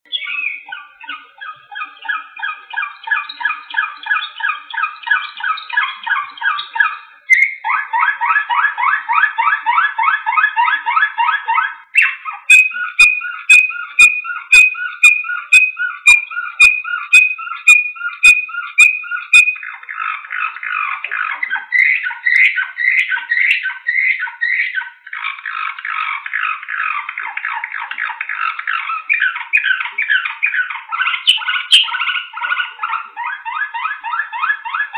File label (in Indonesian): sample suara kenari slavujar